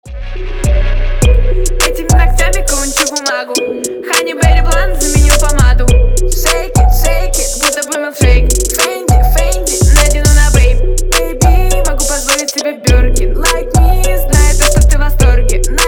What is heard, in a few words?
drums